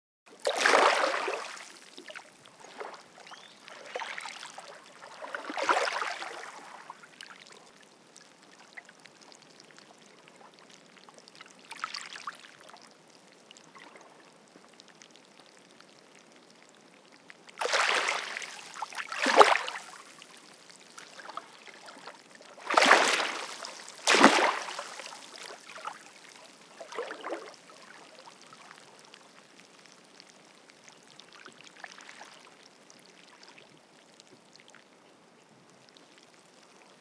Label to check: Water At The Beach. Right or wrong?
Right